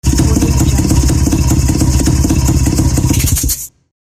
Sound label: turn off motorcycle ignition